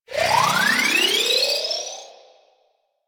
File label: Pistol Active Reload Start; Weapons; Warframe; Drifter; Operators